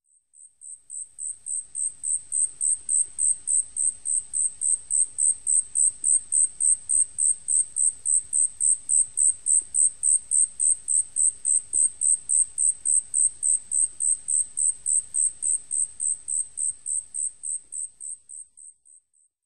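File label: Asian stubtail, ehime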